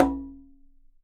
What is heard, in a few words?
conga